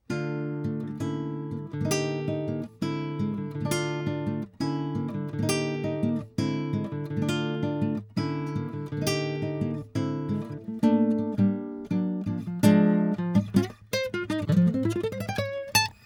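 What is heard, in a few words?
guitar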